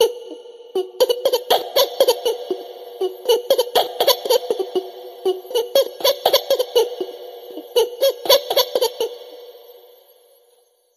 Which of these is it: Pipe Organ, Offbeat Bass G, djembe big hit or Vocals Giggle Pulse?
Vocals Giggle Pulse